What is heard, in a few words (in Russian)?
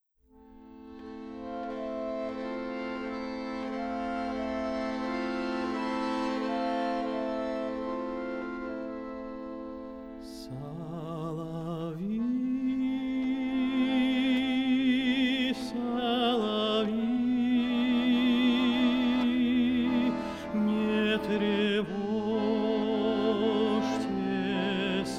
Хор Валаамского монастыря